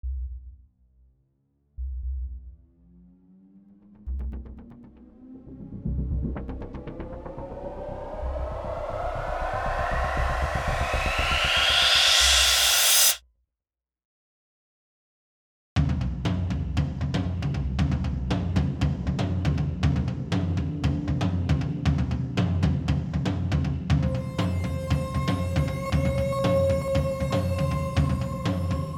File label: tense terror trailer